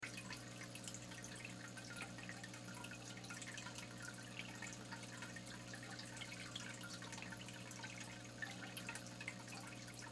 Fish Tank Water, Fish Tank Water Motor Hum Ambience Loop; Splash Sound Effects; Splashes